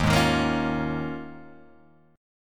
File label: Eb 9th Suspended 4th, Guitar, Open G